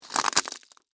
Crack Crunch, Texture, Crack, Crunch, Penne, Rigate; Food Sound Effects; Household